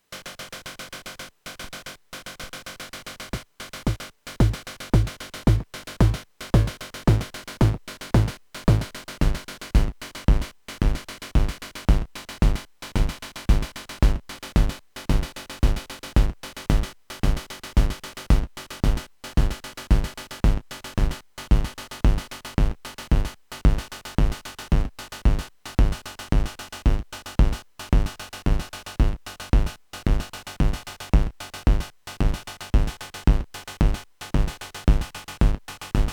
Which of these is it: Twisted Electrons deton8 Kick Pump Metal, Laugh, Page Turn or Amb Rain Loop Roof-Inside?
Twisted Electrons deton8 Kick Pump Metal